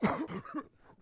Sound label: Cough; Civ4 warlords